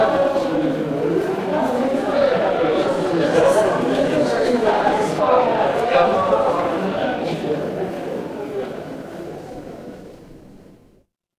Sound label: crowd murmur